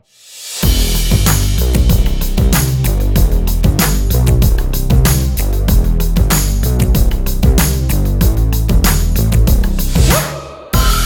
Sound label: hip hop; la based composer and songwriter